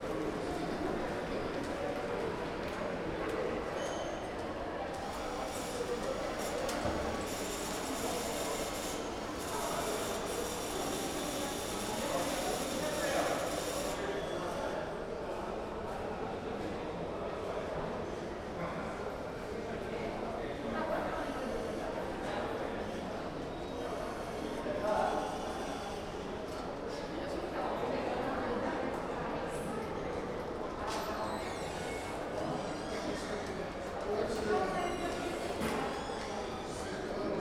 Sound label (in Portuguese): Ambiencia caixa eletronico com pessoas ao longe e porta